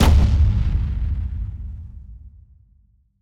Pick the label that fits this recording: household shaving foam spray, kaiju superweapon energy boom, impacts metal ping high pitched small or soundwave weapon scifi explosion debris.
kaiju superweapon energy boom